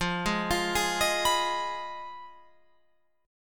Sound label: F Minor 7th Flat 5th, Guitar, Open F; Fm7b5; arpeggiated; 17,15,17,15,18,18